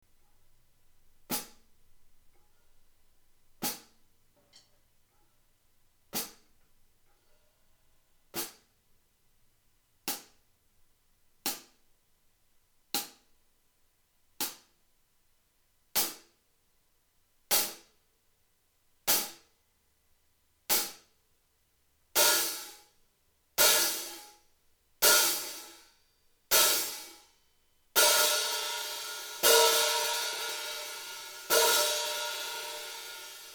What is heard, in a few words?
Hi hat 14; hihat bekkenset; Amedia; Classic